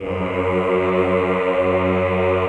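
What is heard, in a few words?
Choir F#1, Ehh; Eeh + Ehh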